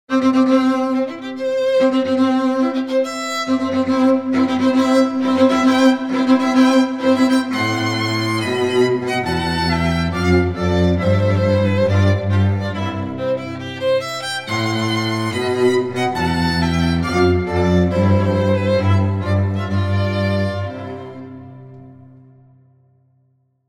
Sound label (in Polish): Trio Mosso – Marsz weselny Mendelssohna